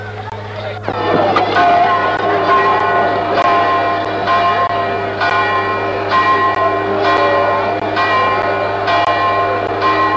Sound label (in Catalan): campana